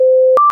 FLARM new contact, glider

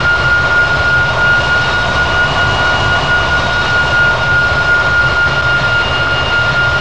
cfm lowspool, CFM56 external; CFM56B; A32X

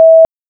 warn

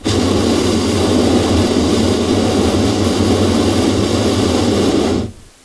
balloon sound

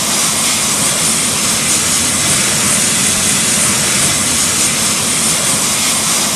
V2500 idle Rear, external; A32X

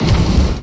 320 touch; A32X

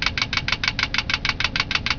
overspeed

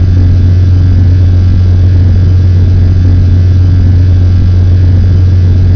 V2500 full hum, wing aft; A32X

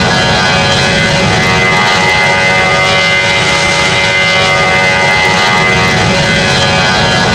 V2500 buzz, external; A32X

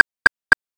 click, Cockpit; A320

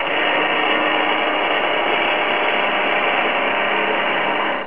flaps

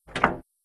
cockpit door lock; A320

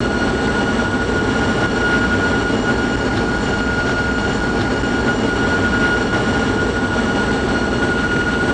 CFM56 spool; A32X

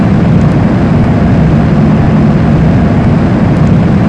320 gear; A32X

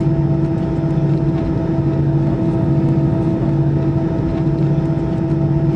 CFM idle, CFM56, wing aft; A32X

